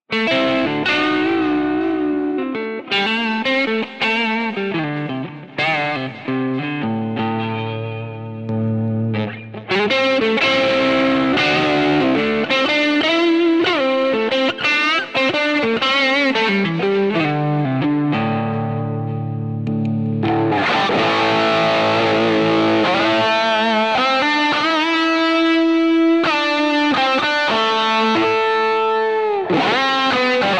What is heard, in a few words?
Carl Martin PlexiRanger Cubase Direct